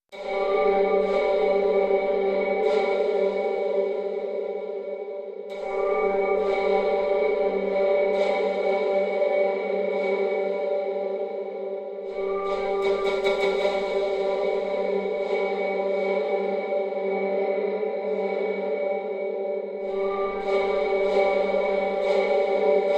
Suspense, No Banjo

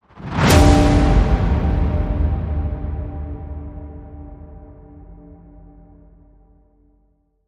Dark Church Hit; Midnight Mass

